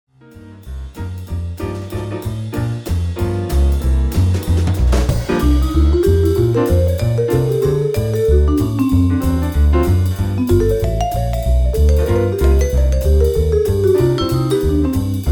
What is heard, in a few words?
Vibraphone Jazz Combo